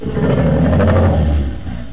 growl; avp2

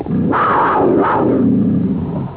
growl

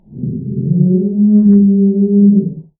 herb call

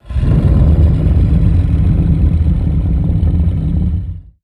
trex growl

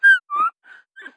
Dilophosaur

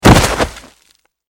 dino death impact

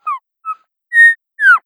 Dilophosaur

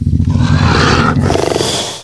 raptor; avp2

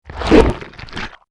dino bite

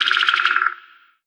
rapt click short

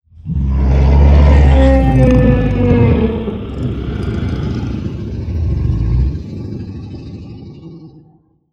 t-rex growl

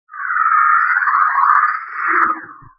rapt click mid

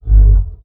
trex snort

